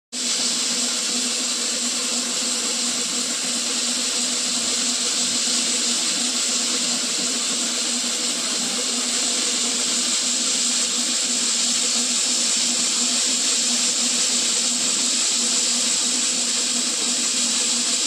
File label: Polishing machine